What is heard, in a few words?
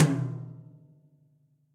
Tom Mid Big Punchy; drum hits; Ableton; Factory Packs; Session Drums Club; Standard Hits